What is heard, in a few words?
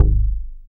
BAS MKS-50, Roland